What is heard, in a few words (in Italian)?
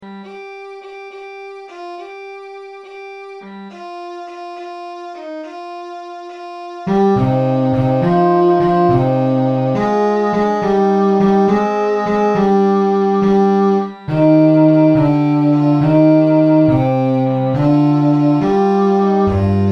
pastori melodia bassi